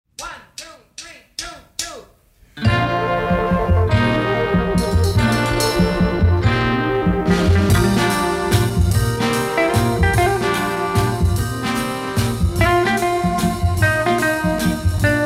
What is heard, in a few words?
guitars